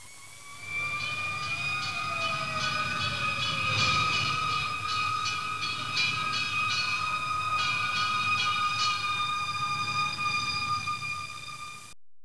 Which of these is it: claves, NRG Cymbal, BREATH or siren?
siren